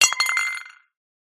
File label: impacts plate dish ceramic drop ground no smash